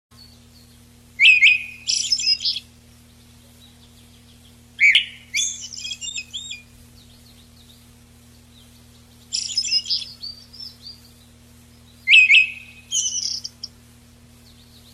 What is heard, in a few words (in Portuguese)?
Canto do tordo escuro